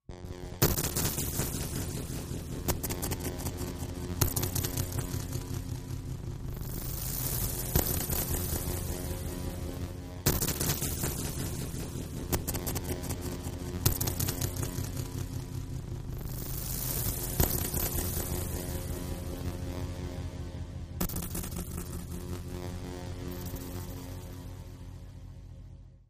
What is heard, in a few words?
Magnetic Arc Tracer, electrical